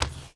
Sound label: drag step; DoomRTX